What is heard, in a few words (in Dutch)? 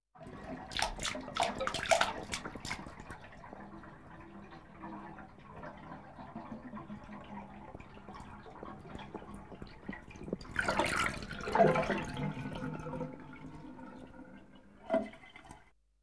Bad Loopt Leeg; KMP Film Video Sound Effects